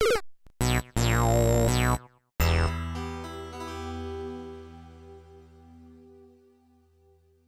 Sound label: Down, Death Music; SMM; Luigi